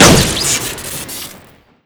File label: mk18 shoot crit; tf2classic